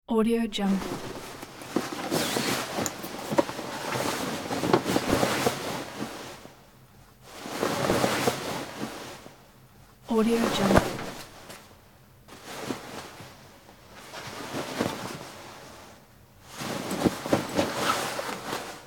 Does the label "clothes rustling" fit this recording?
Yes